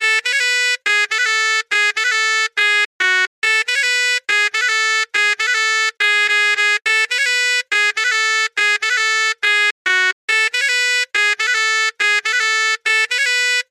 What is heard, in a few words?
Baraat shenai loop BPM 140 A; Gully Loops